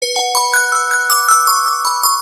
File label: tone; vertu